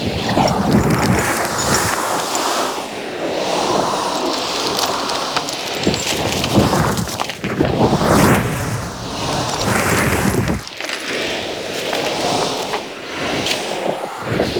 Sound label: Hover Idle Loop; Characters; Enemy; Mendicant; MndWazard; Movement